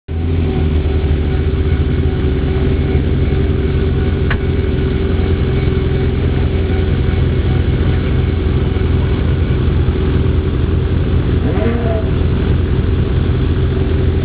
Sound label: Ferrari 365 GTC Sound